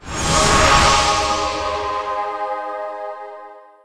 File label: level up; RPG Maker; Legend of Heroen v1.20